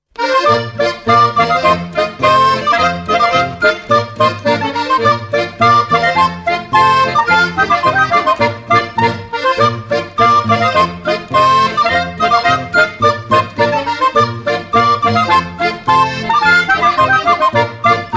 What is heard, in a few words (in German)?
Volksmusik; Spalt